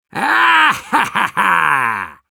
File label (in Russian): Demoman laugh evil